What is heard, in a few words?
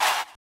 Chants, Vox